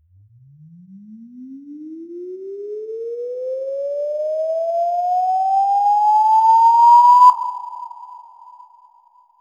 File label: Breakdown FX, Underground UK House & Electro